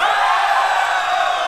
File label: crowd cheers; ooh ahh; human